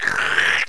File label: lick